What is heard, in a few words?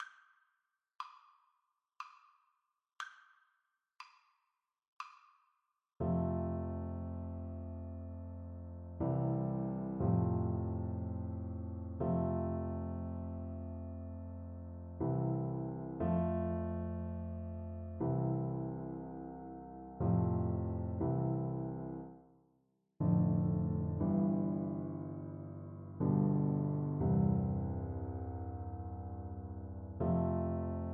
clarinet concerto, Trombone